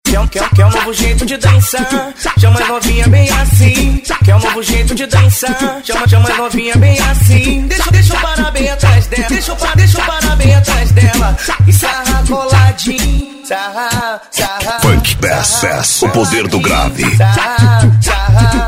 funk bass o poder do grave

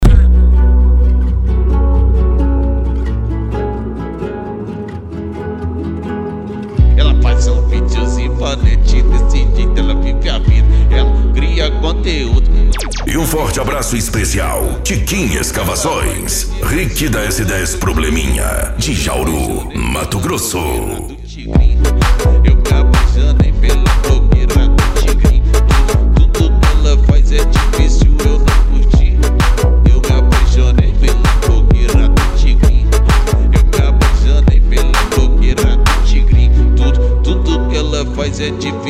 som automotivo

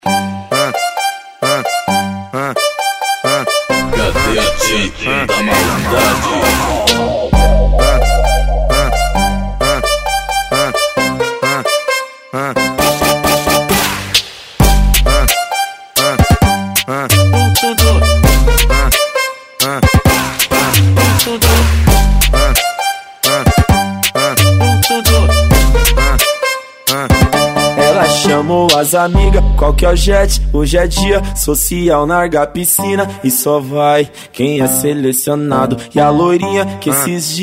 especial funk

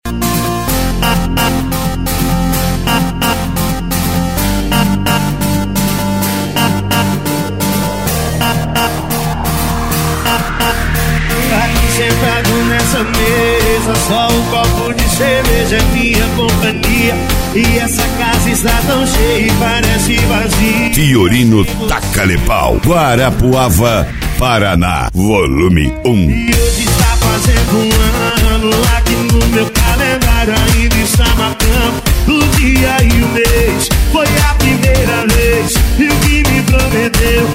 especial funk e electro house

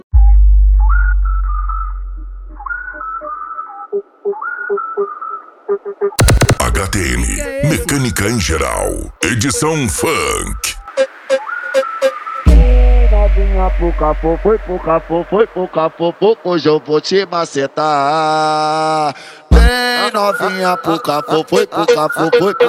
mecanica em geral edicao funk

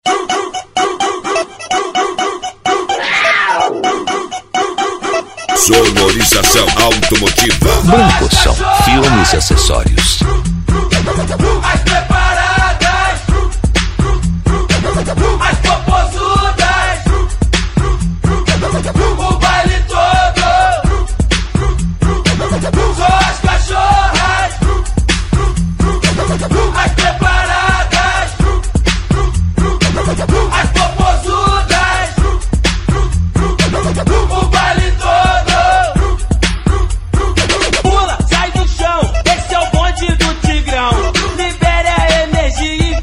dance e funk retro; branco som

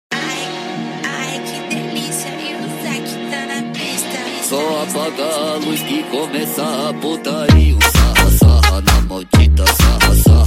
racha de som automotivo e som musical

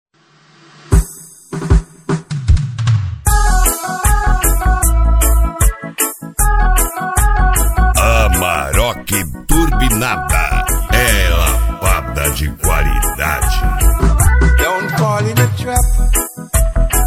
reggae do maranhao

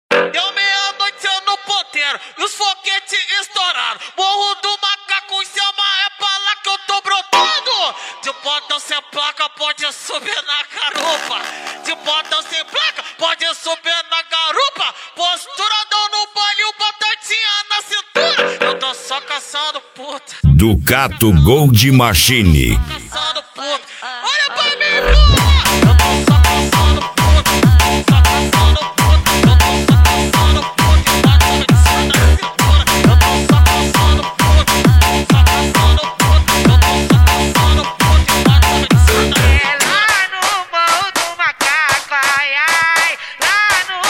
funk agressivo do sul

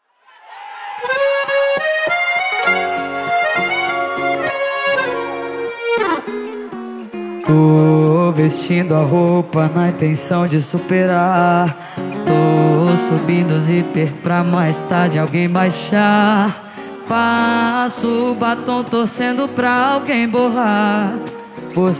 ao vivo, sertanejo

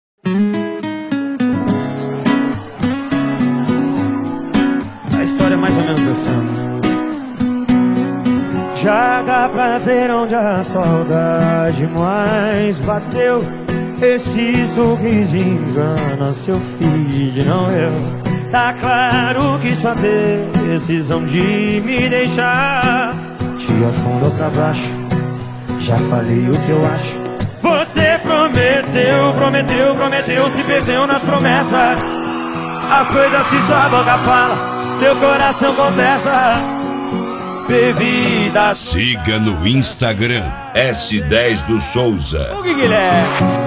sertanejo

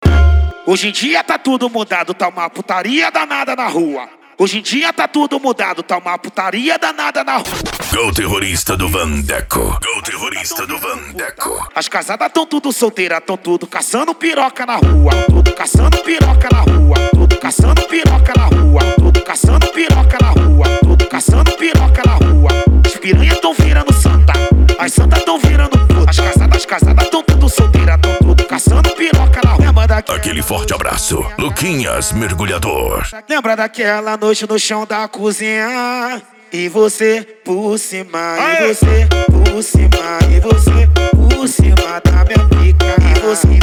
dance e funk mega bass

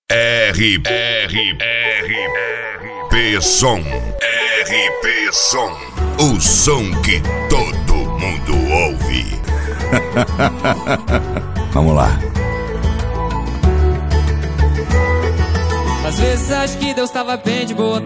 sertanejo universitario

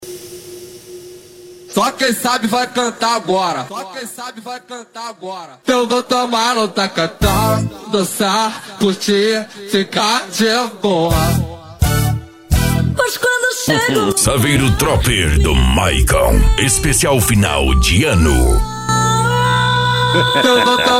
funk agressivo funk

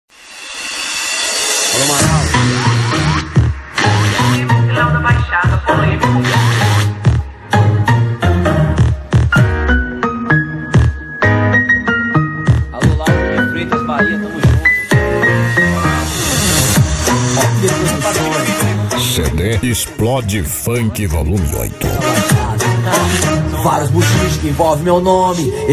explode funk

